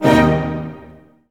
HIT; AKAI S-Series CD-ROM Sound Library VOL-8

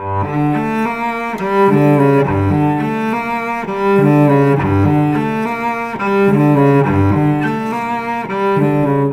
Cello, Cinematic; Sonic Foundry (Sony Creative Software); Orchestral Series VOL-3; Cinematic 11

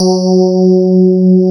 SPARKLES; Wizoo - Powered DX

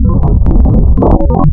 PROPHET VS, wavetables, Classic Synths

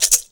MULTI RATTLE; Best Service; World Colours

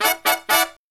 Killer Brass Riffs

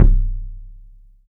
THUD KICK; 3D Drums

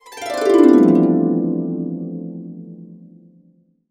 C MAJ, Harps; USB Soundscan vol.62; AKAI

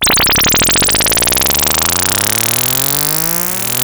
Moog C3; Hollywood Edge; Giorgio Moroder Rare Synthesizer Collection; MLTI.MOOG

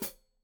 Hat Foot, Hi-Hat, Cymbals Stereo

